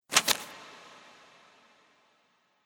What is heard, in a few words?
Monastry Manuscript Paper